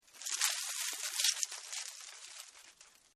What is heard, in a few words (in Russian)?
shelest listev na dereve